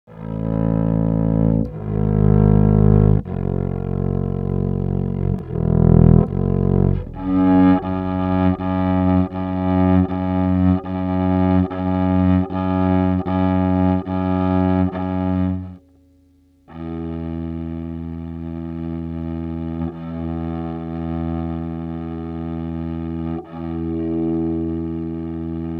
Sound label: bass arco